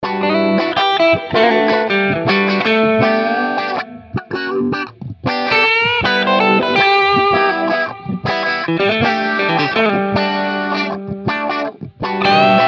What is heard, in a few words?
Guitar Bits and Bobs